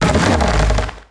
root hit; DAoC; Toa; Gamestar

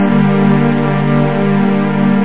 string

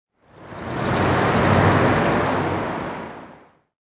traffic; zones

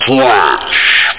trash

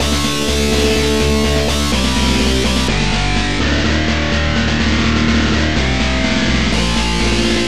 Bonus; FRACAS 1.0; Fracas Music

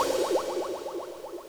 FX, ambient, 160; eJay

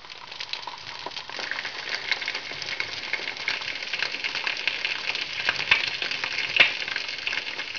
sizzle; Image Bonanza; Expert Software; househld

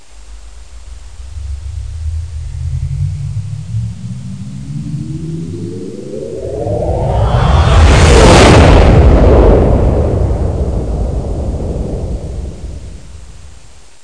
warp

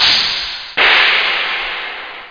cymbal; instruments

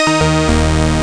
Gnarly Stack, Instruments

